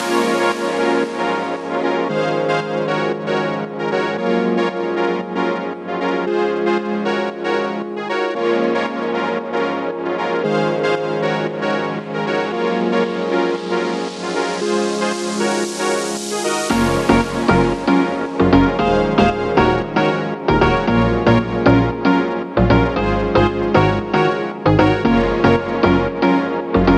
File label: electro dance